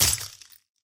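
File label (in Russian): короткий звук небольшого разбитого предмета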